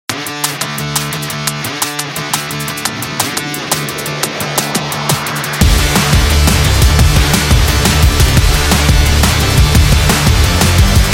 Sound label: Dynamic Sport, electro rock